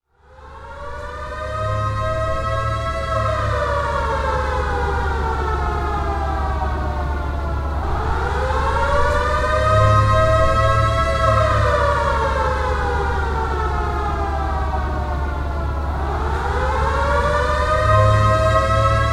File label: Quarrying, three siren blasts (Short World War II siren); Machinery; BBC